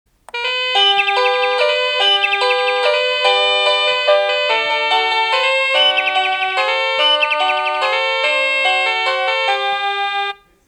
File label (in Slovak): Ukážka melódie zvonenia zvončeka